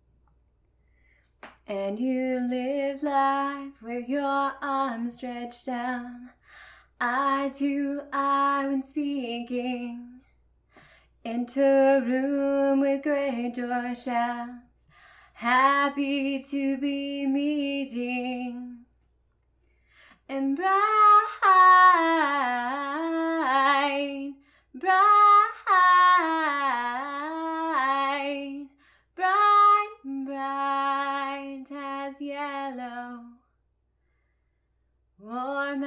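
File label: singing, a cappella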